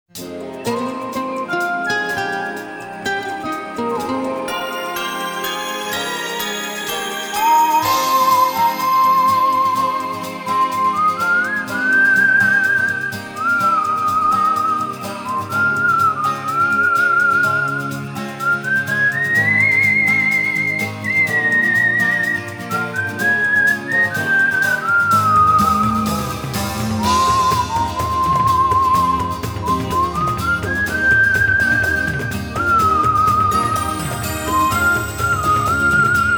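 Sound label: Advanced Wind Whistle Version; Wild Arms Advanced 3rd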